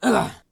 Male Hurt

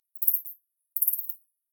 Bat

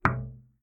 Cancel Or No Button Sound

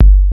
Super Low Bass Hit Kick Drum

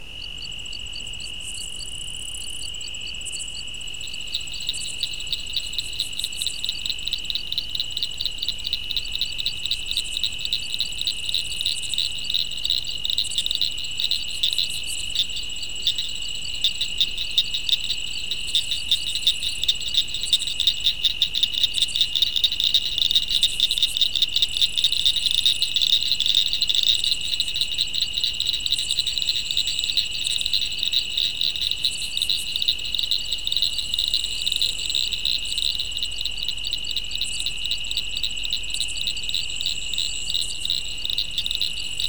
Oklahoma Summer night Wildlife

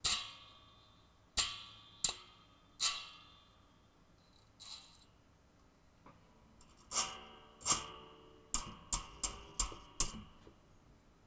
Spring

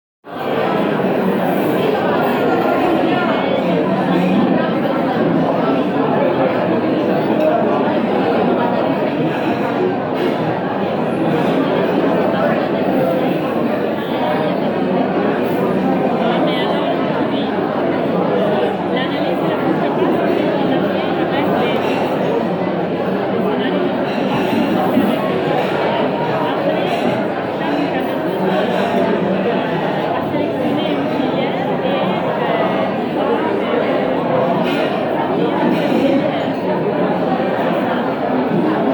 Conference Lunch

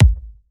Kick live sounding punchy kickdrum

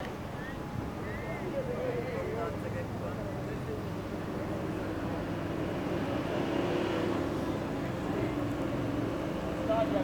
Streets Day Quiet

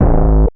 909 Kick

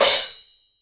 Crashes Mix

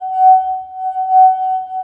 Singing glass

